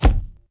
Flash Pot; Civ4 warlords

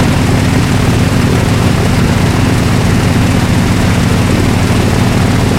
blenheim side, engines; War Thunder

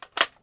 Inf Weapons Foley; Red Orchestra Ostfront 41-45

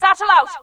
satchel out; Titanfall 2

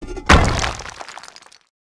stone crash mid; World of Tanks Blitz

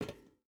footstep rubber boots metal walk; Rust

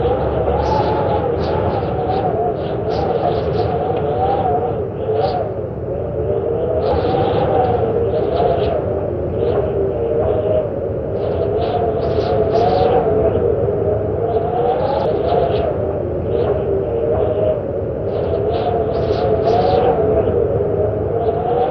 Amb Weather; Red Orchestra Ostfront 41-45

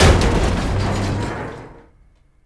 Metal Ship Hit; Civ4 warlords

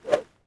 Arrow Fly; Civ4 warlords

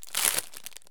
MRE open; Escape from Tarkov

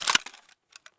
ar pickup; Escape from Tarkov

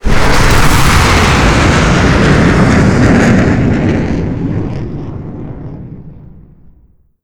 mig29 flyby short, vehicles; Call of Duty Modern Warfare

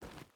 footstep boots forest walk; Rust

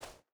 footstep burlap snow jump start; Rust

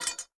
ui drop spring; Rust

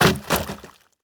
objects, World of Tanks

